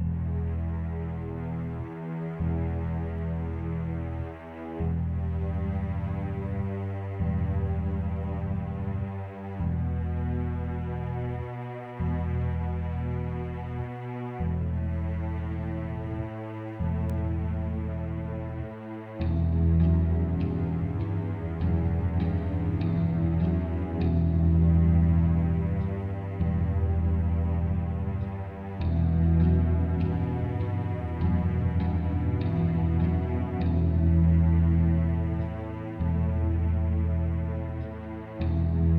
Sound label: guitar; Project Zomboid; luatriggered